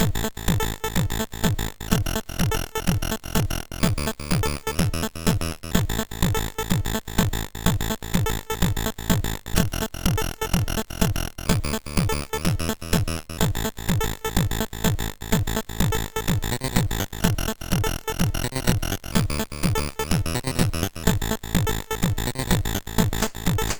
Tekkno, MOS6581R4

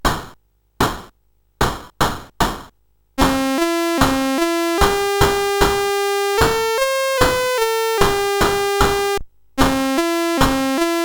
CSG 8580; CSG8580R5

